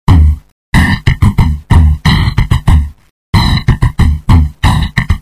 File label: beat box